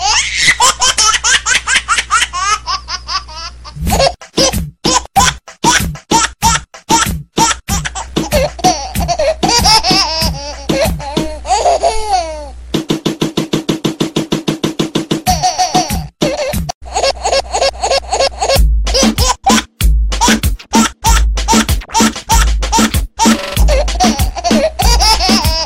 hip hop, ringtone; holiday

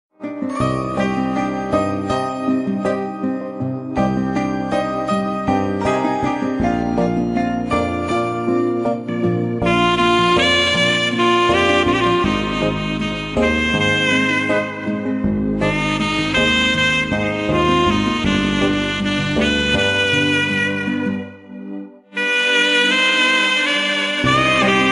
romantic, ringtones; blues

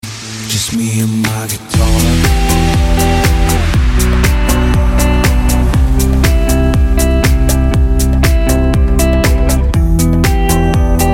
Guitar

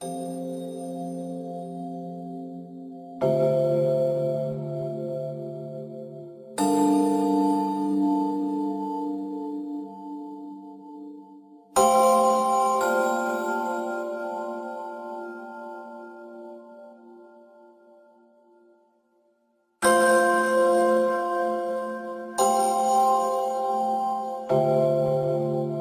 bells; Wintergrasp

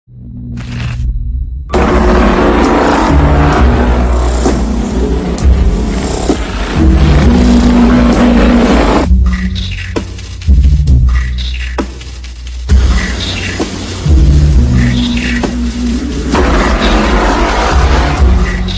theme song

